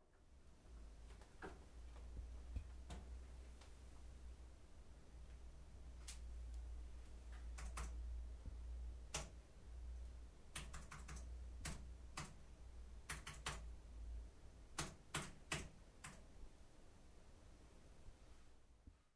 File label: Foley